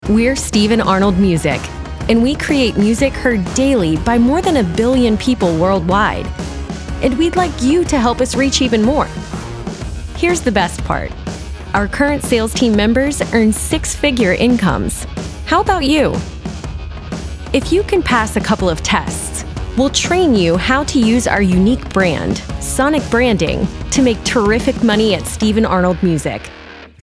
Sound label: Music; Stephen Arnold Music